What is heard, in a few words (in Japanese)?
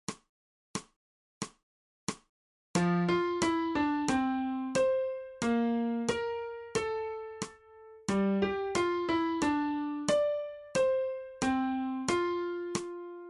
新フレットレス